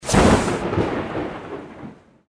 anticipation Start; Champions of Valhalla

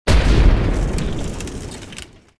Explosion; Dynamite Digger Eureka; original dynamite digger audio

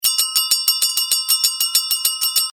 bell slow loop; Step Back 7's

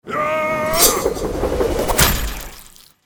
berserker Anim; Champions of Valhalla

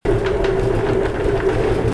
Motion; Dynamite Digger Eureka; original dynamite digger audio

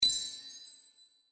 win chime; Eternal Wishes LuckyTap

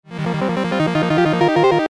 rollup short Fade In; Fluffy Favourites Megaways

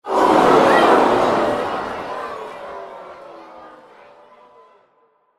crowd disappointed; Football! LuckyTap; Eyecon

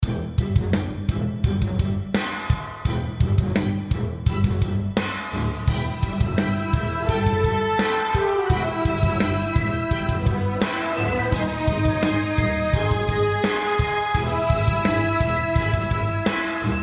general Music; Champions of Valhalla

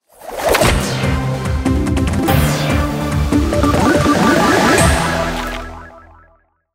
you won sfx; Hot Off The Press

